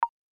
bounce

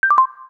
home, keytone, Samsung SGH-T369; Samsung SGH-T369 Linx; Paragon

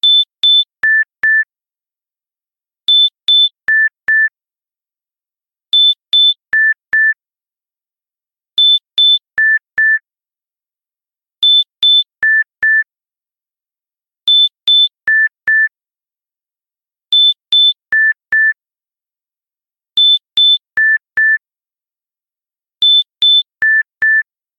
Step Beep, ringtones; LG Spectrum

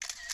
camera click

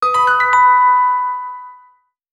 Alert; Sony Ericsson; W902; PlusFourSix; Patti; Lars Edvall

